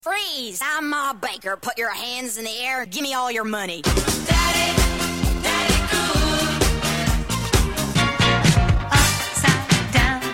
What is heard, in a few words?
Disco Mix; Disco Fever